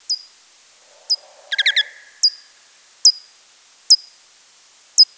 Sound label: Pipistrellus, Bats